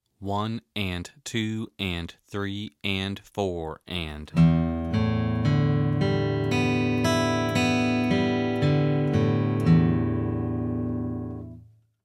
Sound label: Arpeggios